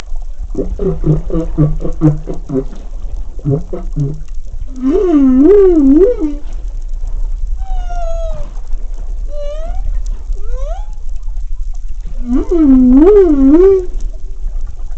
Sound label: humpback whale song; acoustics